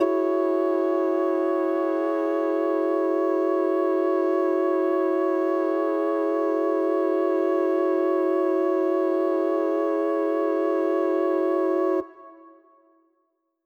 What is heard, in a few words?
Mixed Winds E6:9, synth orchestra